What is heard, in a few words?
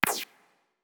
Projectile Burst; gamerip; Grab Spitter